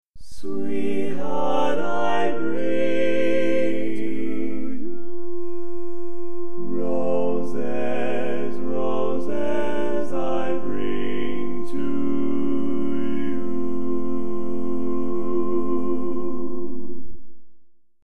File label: Tenor, barbershop